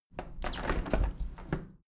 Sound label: window handle plastic squeak open tilt regular; objekte; fenster